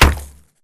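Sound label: Bullet Light Ballistic Concrete impact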